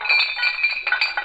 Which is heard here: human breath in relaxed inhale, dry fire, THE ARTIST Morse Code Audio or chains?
chains